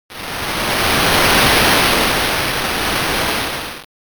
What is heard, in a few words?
wave